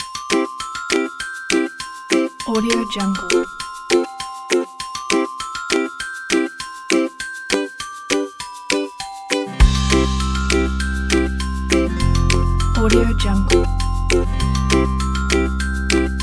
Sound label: happy kids ukulele and bells